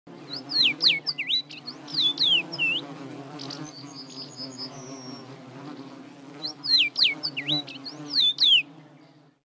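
obyknovennaya chechevica golos, common rosefinch voice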